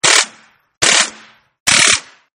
Rifle Silencer Burst, 22 Lr Rifle With Silencer Attached Burst Fire; 22 Lr Rifle With Silencer Attached Burst Fire-3 Takes; Guns